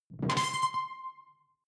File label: sci-fi alien console high; soundpool